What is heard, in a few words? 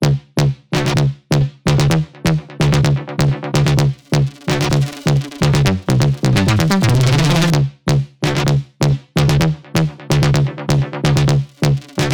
128 BPM D Min Bass, House; Drop Loops; Cymatics; Destiny